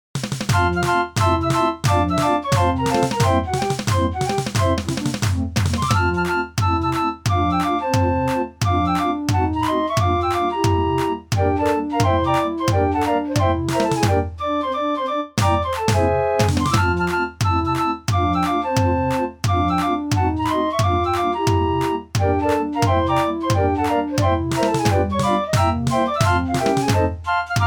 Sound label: draaiorgels